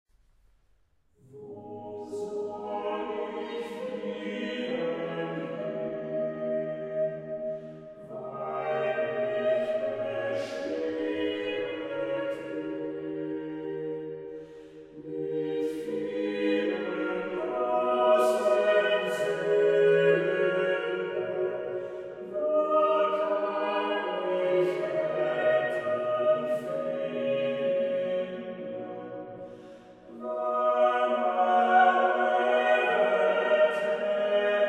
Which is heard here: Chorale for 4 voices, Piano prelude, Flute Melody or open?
Chorale for 4 voices